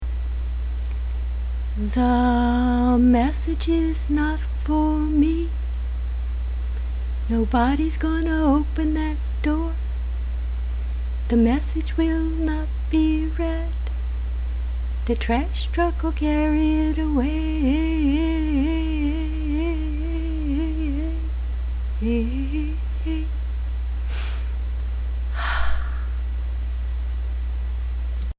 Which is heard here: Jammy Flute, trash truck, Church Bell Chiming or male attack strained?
trash truck